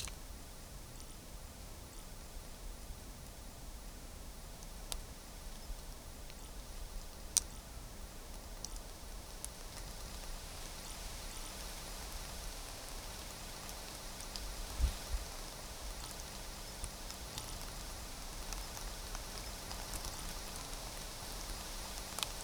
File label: little dragon forest moun